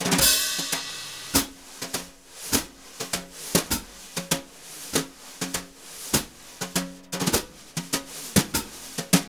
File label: BRU, BRUSH; Univers Sons; Jazzistic